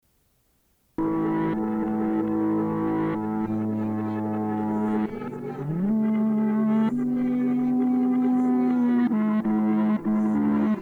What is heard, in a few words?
instrumental